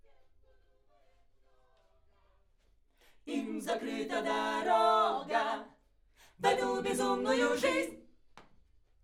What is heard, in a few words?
Backs AKG LEFT